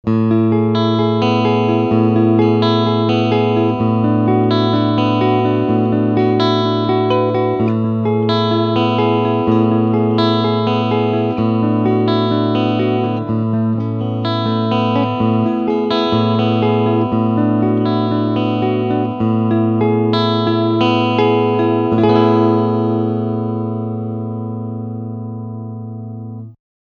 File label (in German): Clean picking beide Pickups